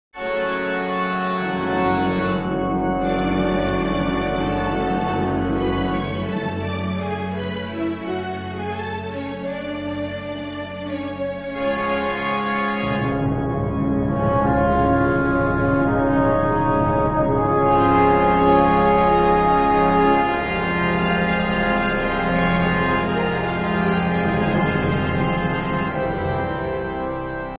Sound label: organ concerto start